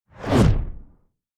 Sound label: Projectile; Afterbern Democralypse; Windows